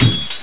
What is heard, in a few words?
parry; Battle